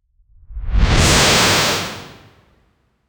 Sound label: realistic soun, air displacement